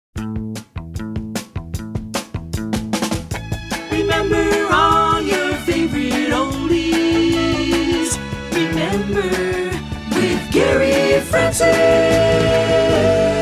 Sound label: Jingle; Short Attention Jingle Show IV